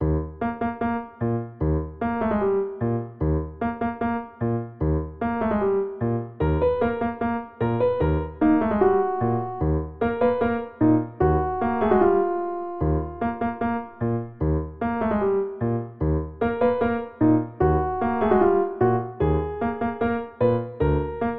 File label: Piano; Animal Crossing